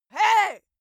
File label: female attack